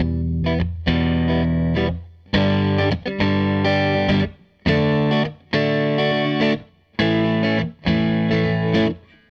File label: Gretsch Guitar Loop